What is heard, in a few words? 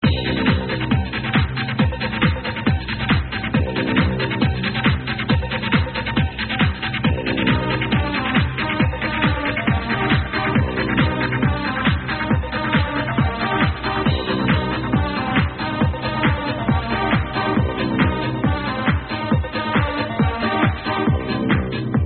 old techno